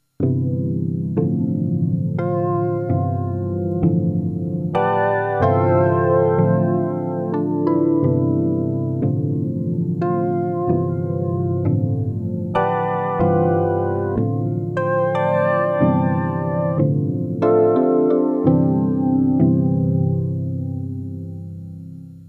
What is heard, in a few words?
xfade, multiphase; Scott Stites